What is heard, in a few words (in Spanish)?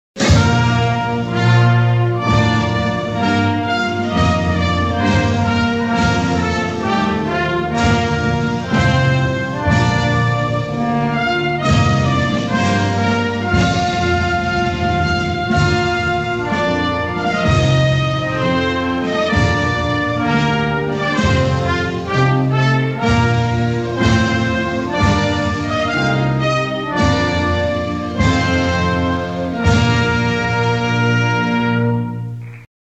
Himno Nacional